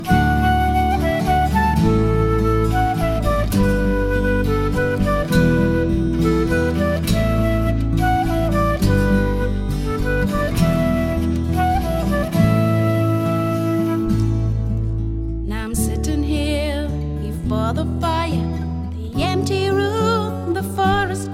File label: Caledonia